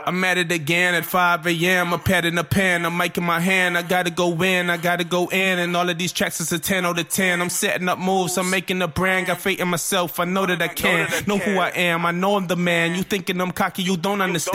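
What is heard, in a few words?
hip hop, 130, G minor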